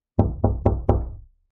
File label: door knocking; witch